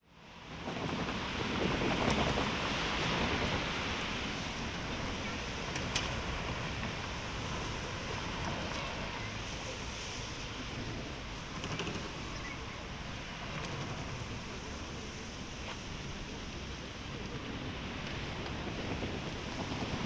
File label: binaural